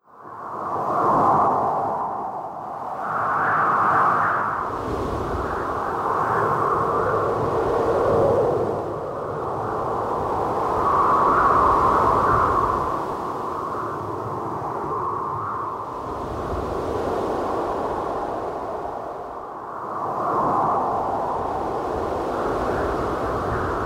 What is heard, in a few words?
Wind measure